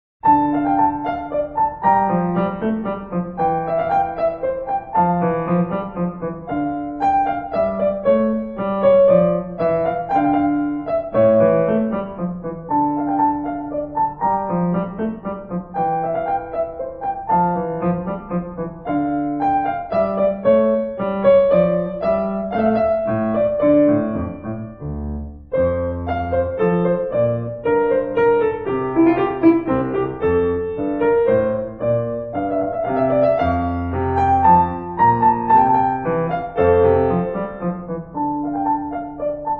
menuet moll; buttstett johann heinrich

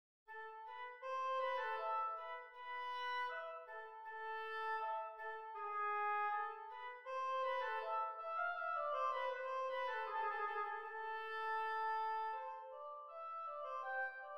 rigaudon mineur; telemann georg philipp

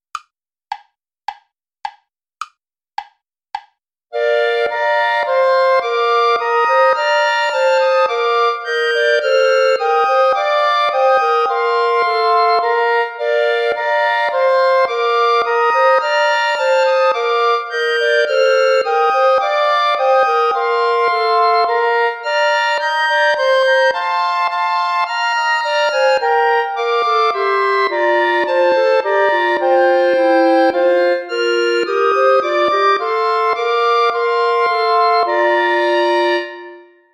play along clarinet; bach johann sebastian; was gott tut das ist wohlgetan

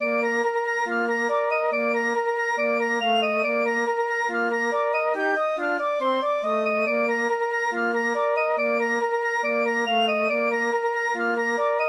for flute trio; fair fa' the minstrel

